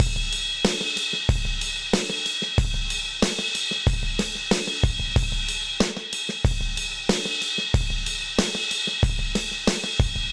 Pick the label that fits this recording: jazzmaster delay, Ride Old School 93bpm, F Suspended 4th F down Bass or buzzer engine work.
Ride Old School 93bpm